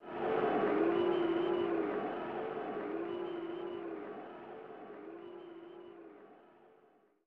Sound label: Booing; Total Football; SNES